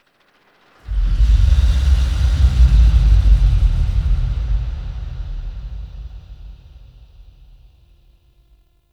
Exhale Large; Ambience; Warframe; Sentient Lair

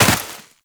Land Snow, Footsteps; Warframe; Tenno

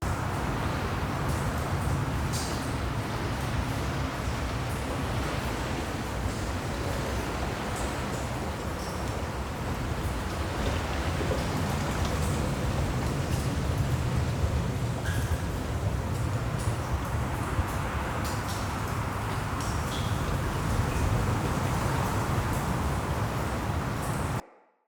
Cave Ocean Amb Loop

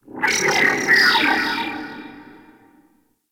Ship Data Chatter; Warframe; Corpus Ship Remaster

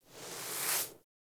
Puppy Foley Sit Idle To Stand Idle; Warframe; Kubrow

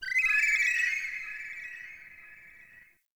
flute bird; Warframe; Tau

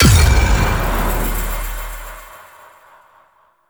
Hit React Quick; Warframe; Duviri; Dax

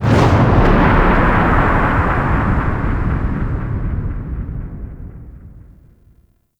Environmental Booms; Ambience; Warframe; Man In The Wall

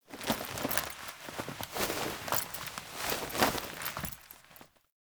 Cloth Movement; Warframe; The New War; Drifter; Foley

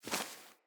Wing Flap One, Animals; Floppet

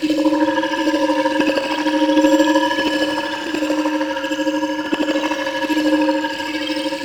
Movement Loop; Warframe; Characters; Sentient Sensei

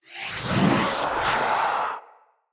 Staff Caster Charge Heavy; Weapons; Player; Staves; Wazzard Staff Caster

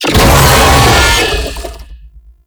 Vocal Pain, Infested; Warframe

